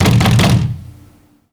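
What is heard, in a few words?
knock; NOKKU H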